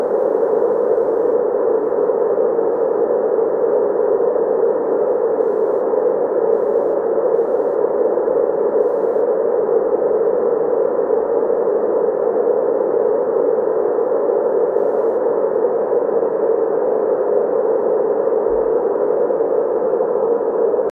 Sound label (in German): Rauschen Dunkel